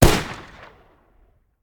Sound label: Rifle; Sound Ideas; Guns